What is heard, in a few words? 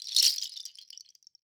Ekpiri Belt Shaker; World Percussion; Cymatics; Emperor World Percussion